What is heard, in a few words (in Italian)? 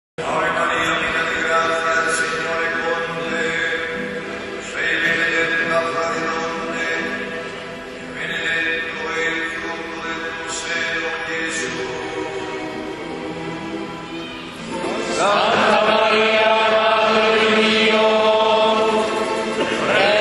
ROSARIO CANTATO